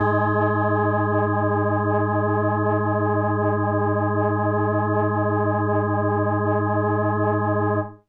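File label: Indie Organ Kawaii G#1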